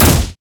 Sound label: rumble basic attack